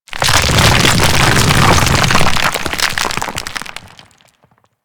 Golem Gun Submerge; Warframe; Foley

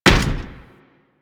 Step Default, Footsteps; Warframe

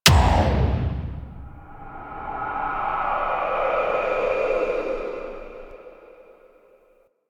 Infestation Passive; Warframe; Warframes

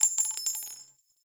Sniper Shell Casing; DE; Warframe; Frumentarius; Exalted Sniper

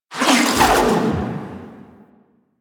Grapple Travel A; Warframe; The New War; Archon; Nira; Abilities

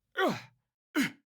Vocalizations; Garren Heal Part Two; Envoy; Garren Boss Arena

